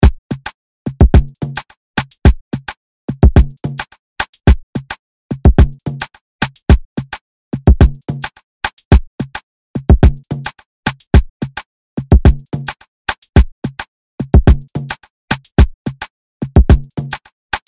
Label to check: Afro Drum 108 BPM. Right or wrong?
Right